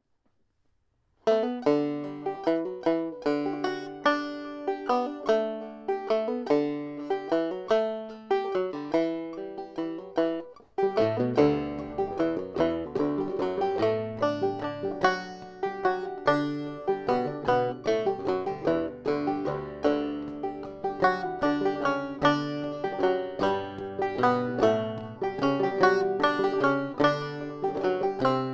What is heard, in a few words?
tolvana waltz, banjo